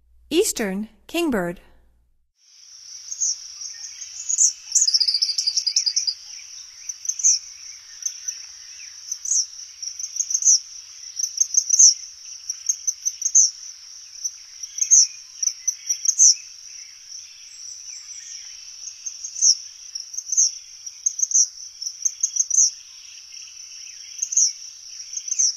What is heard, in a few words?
Eastern Kingbird, Songs of Birds of North America; Stokes